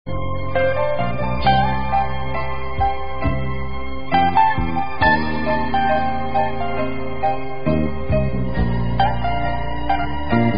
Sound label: Instrumental